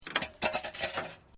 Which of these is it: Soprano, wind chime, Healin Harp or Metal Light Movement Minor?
Metal Light Movement Minor